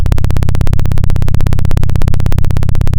Sound of a plane taking off

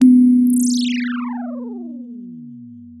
pop, Sound of a phone ringing

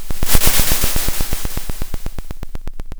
Sound of a drum roll

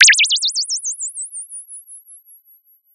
Sound of a bird tweeting nicely

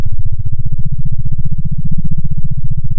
pop, sound of a small motorcycle

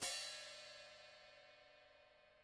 room Pd Hat, drum

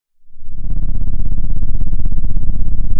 Sound of an engine revving

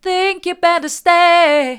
mid, vocal; think you better stay

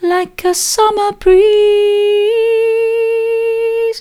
like a summer breeze soft, vocal

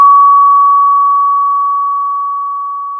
Sound of a school bell

